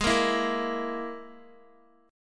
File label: Ab Suspended 2nd Sharp 5th Ab down Guitar; strummed; JGuitar